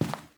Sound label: Footstep Carpet; Phasmophobia